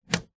household mains plug australia pull out remove wall socket; zapsplat